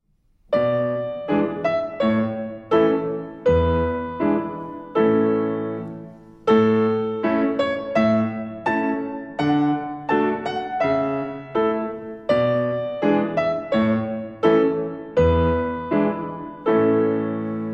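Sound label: May Song Medium Practice, Violin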